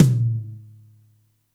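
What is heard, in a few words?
TOM soft; Zero-G; Chemical Beats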